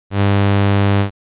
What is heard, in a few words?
saw 100, standard waveforms; praat; understanding waveforms